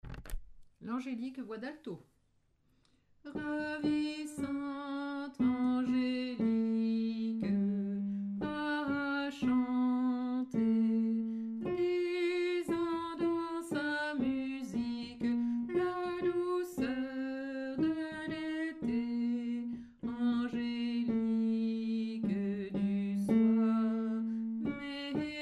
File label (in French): l'angelique alto, choeur femmes